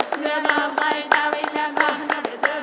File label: ENAWA, CLAP CHOIR; Spectrasonics; Heart of Africa 2